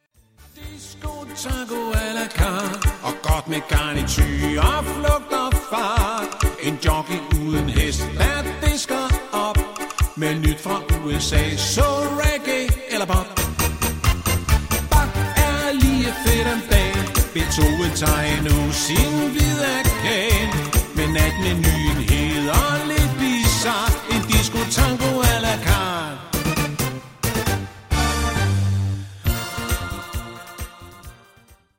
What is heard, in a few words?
Disco Tango